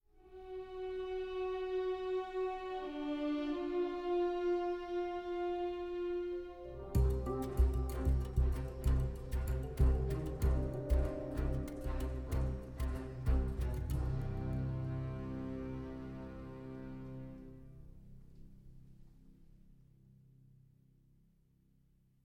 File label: String; assassin s creed unity gamerip 2014